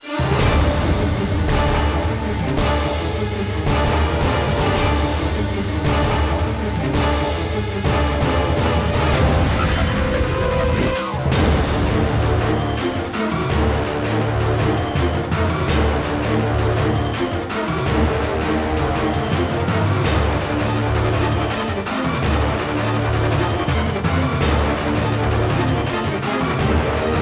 Bgm Battle; Ninja Gaiden Dragon Sword

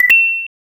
Get Coin!; super mario rpg 1996 snes

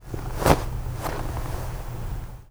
land High foley; gamerip

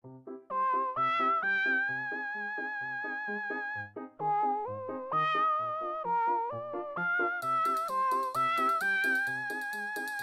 Ingame Music; Bucketz

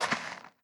sand footstep

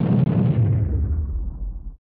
Explosion; Shoot Your Load SNES

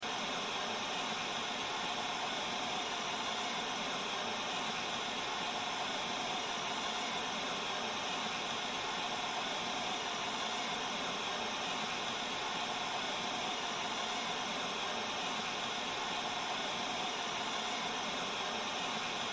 tv noise; cry of fear

